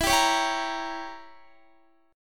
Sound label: E Diminished 7th E, Guitar; Edim7; strummed